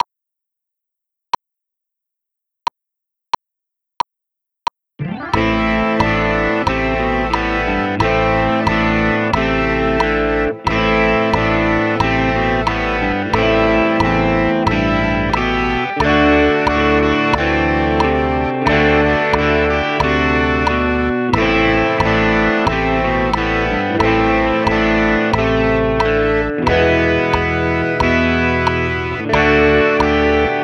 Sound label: Rock Guitar